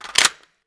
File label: browning mag in, weapons